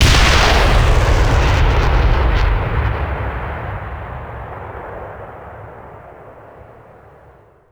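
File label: axis bazooka soldier; WAR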